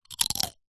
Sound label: human eat crunch bite single rice chip